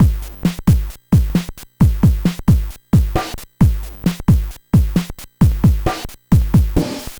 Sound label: NES Drum Loop; Sonic Foundry (Sony Creative Software)